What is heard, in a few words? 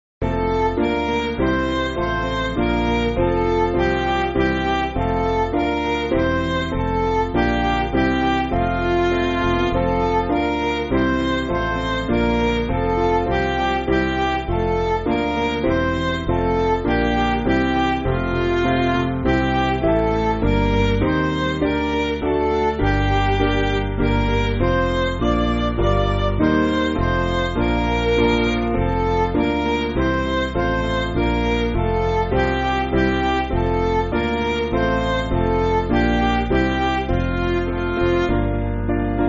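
Midi, Music Backing Files for Churches; Werde Munter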